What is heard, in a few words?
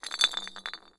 Household Glass Spin Beer Bottle